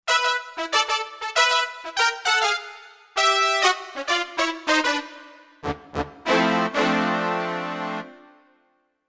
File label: Brass Earth Brass